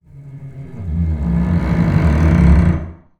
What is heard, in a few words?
TALK BOW, BASS FINGER; Xsample VOL-5